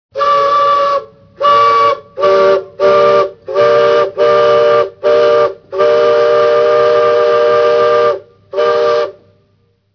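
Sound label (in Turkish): Tren Düdüğü uyarı zil sesleri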